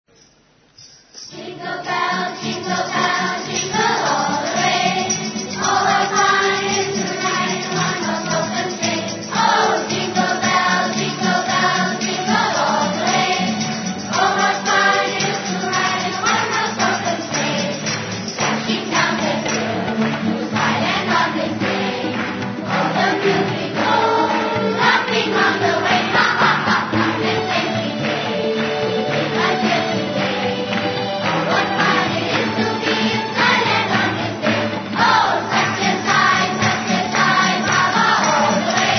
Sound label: CHRISTMAS CAROLS CHILDREN JINGLE BELLS